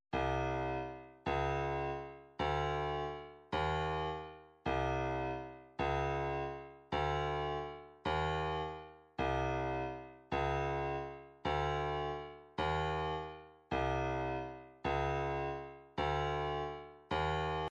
106 snare

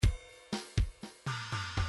fills rock fill

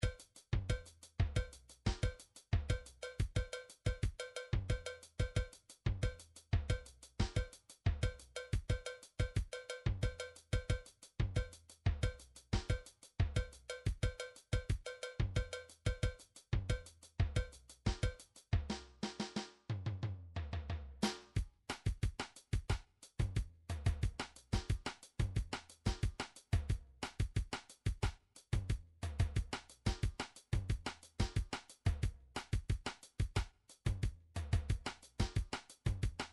drums samba

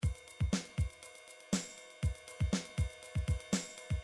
midi drums

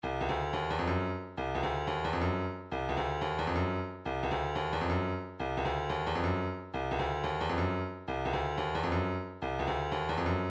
165 doumbek, midi

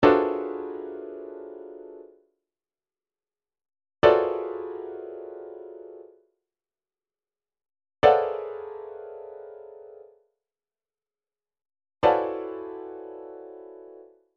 chord lines D, midi; Midi Files 1000

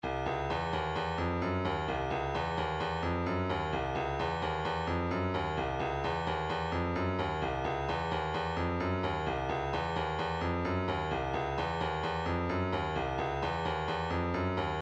130 tambourine, midi

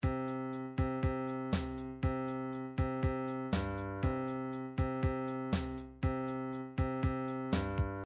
rock, midi

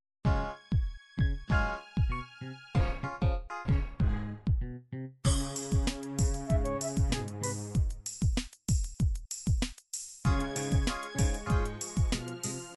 disco, midi

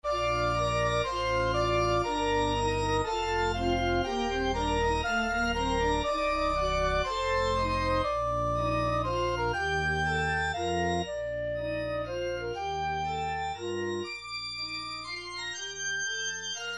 credits; gameboy; sequenced; gameboy camera

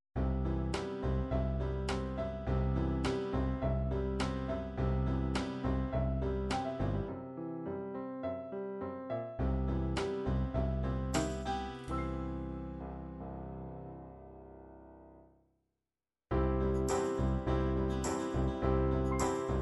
ballad piano ballad

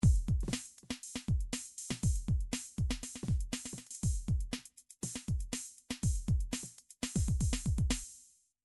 midi drums, triplets

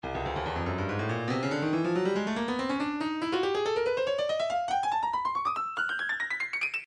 stylus breakbeat midi 141 bpm

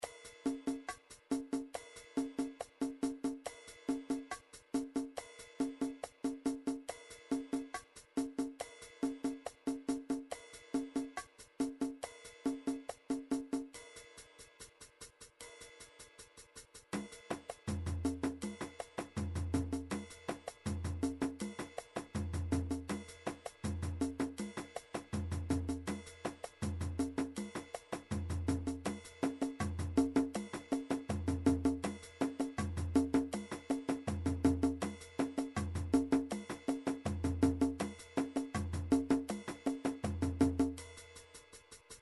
drums; akiwowo

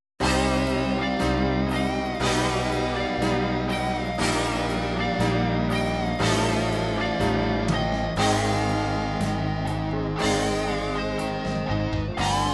guitar

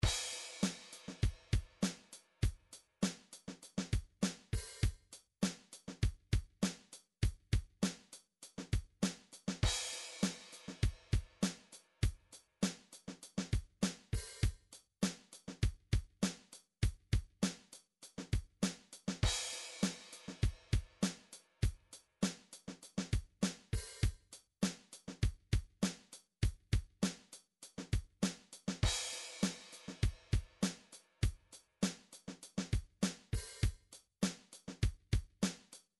midi drums drums

